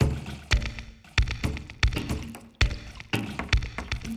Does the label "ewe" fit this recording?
No